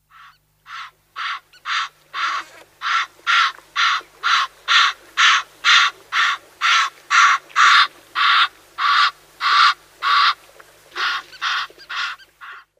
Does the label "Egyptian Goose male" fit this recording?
Yes